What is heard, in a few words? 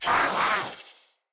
Kavat Vocal Attack Medium; Warframes; Khora